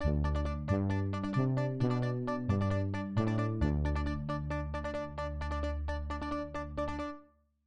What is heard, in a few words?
News Music